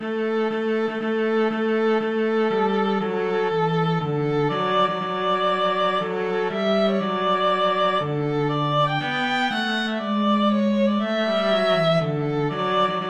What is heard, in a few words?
wedding march, violin-cello duet; wagner